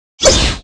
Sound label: ATTACK